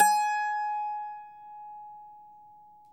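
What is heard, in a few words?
mandolin G#4; Sonic Reality; American Heartland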